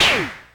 fire; daphne

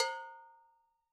Acoustic Percussion One Shot

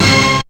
HIT, STAB; SoundFactory; SMPMANIA

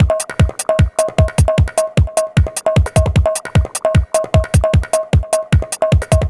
Dance Drumloop; Drums; Dance Beats

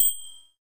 doubloon; theGoonies; Retro

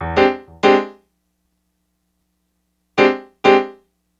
Acoustic Piano

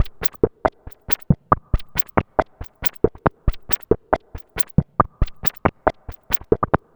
Electronic Percussion

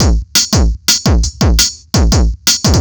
Old School Break, Drums

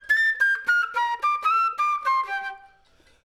Flute

